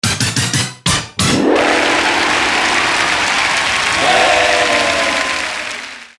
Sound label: Hurray; painting